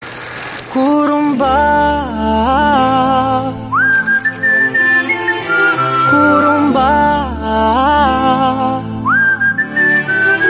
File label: SONG RINGTONE